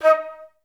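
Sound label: flute staccato D#4; Best Service; Peter Siedlaczek's Advanced Orchestra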